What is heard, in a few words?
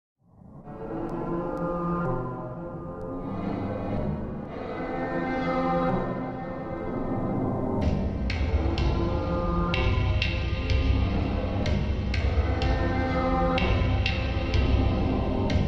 Celtic March; Free To Use VGM Theme Music